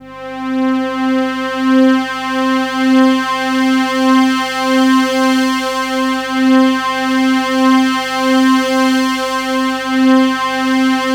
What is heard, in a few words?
PAD6 C5; USB Soundscan vol.05 - Explosive Jungle; AKAI